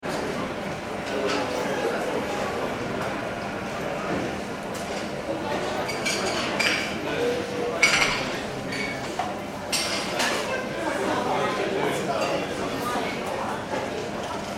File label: ambience airport terminal, Small Terminal; Airport Ambience - Small Terminal Building With Check - In And Cafe Close - By - La Rochel; Airports; Backgrounds; la rochelle; McKinney Sound